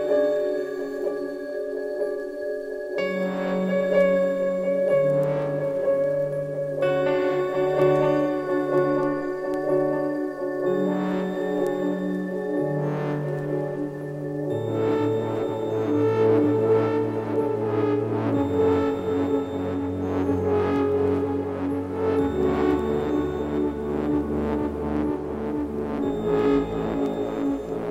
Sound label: Piano